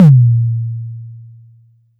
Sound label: Tom; TRX